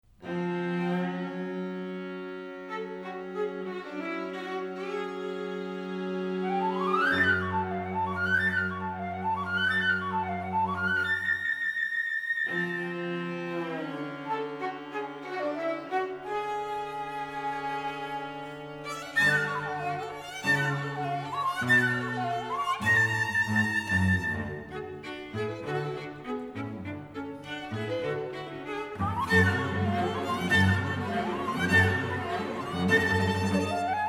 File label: Americana Quartet